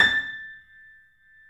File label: Small Pianos, 3-D Audio Collection